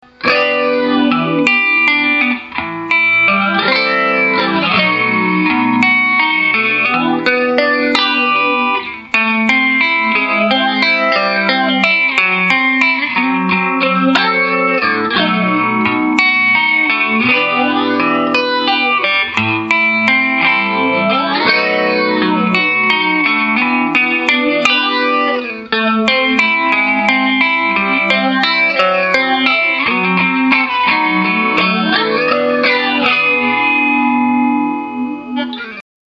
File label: only phase